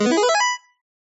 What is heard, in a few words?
Ball, Skee-Ball; TheStable; Pyralix